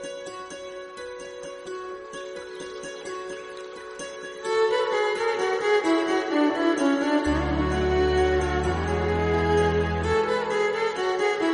instrumental violin bgm; ps1

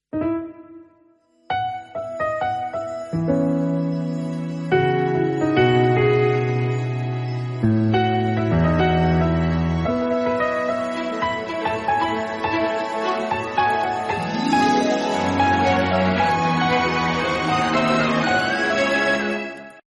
instrumental bgm

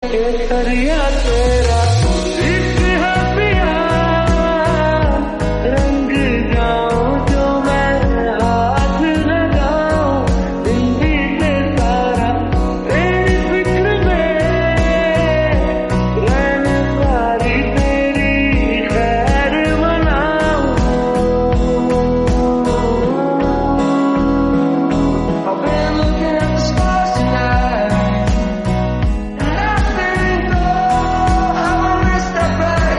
lofi remix song

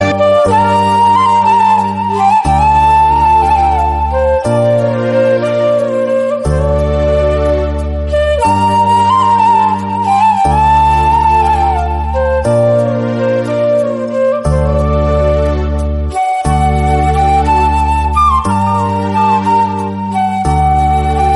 flute bgm tamil; theri

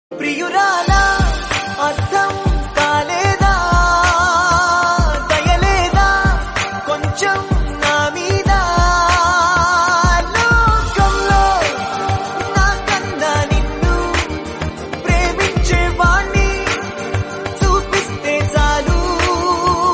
telugu song; mooga manasulu

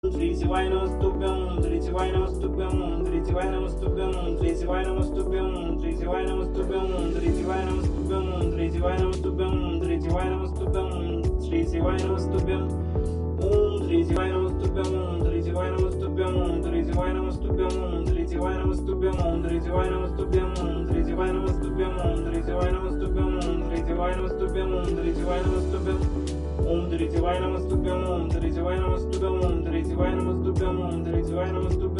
om namah shivay om namah shivay devotional mantra; bholenath

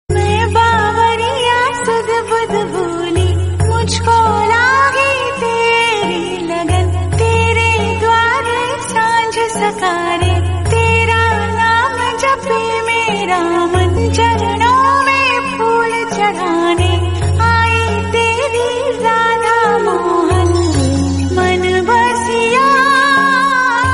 hindi song

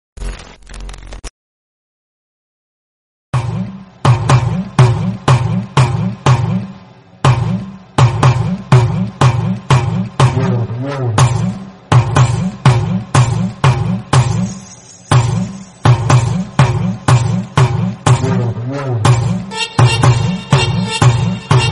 psycho villian bgm song; spyder

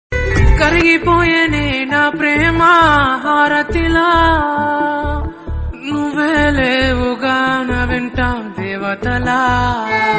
sad song

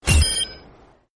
headshot; overwatch

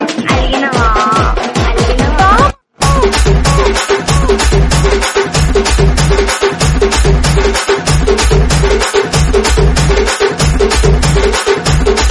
dj remix telugu

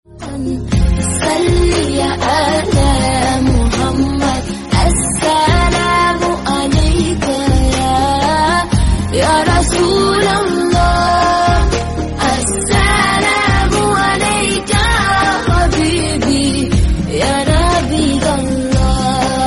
islamic song

